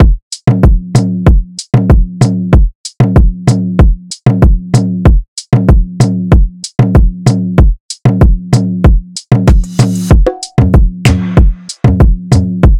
Shaadi Drum Loop BPM 95; Bihu; waves of bihu vol 2; Gully Loops

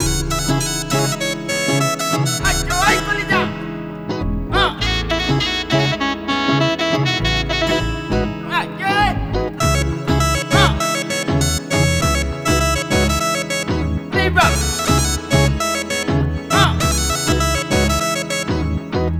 Drop Loop BPM 100 E Maj; Bihu; Infinity; waves of bihu vol 2; Gully Loops

